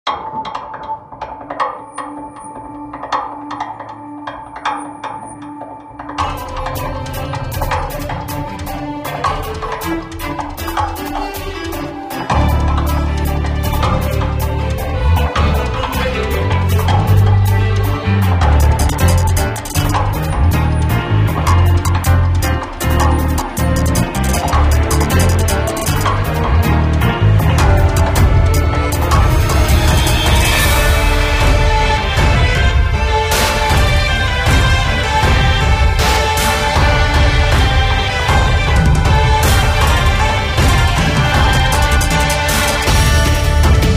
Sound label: Battle BGM